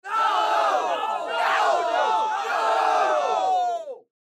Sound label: crowd no